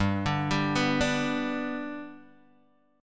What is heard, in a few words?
G Major down Guitar Open G